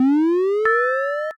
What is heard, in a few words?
cast start